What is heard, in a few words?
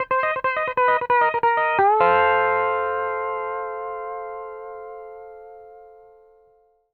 PD LOOPS 140, Whole Lotta Country